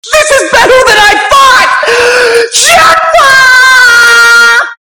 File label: jackpottt